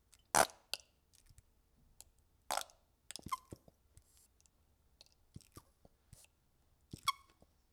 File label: Ketchup Squeeze; Cooking